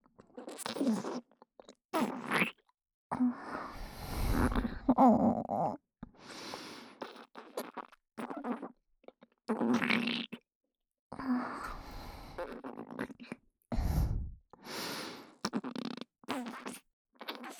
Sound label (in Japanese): ダウナー ねっとり右耳フェラ, ASMR; フェラ素材